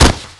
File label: body, collisions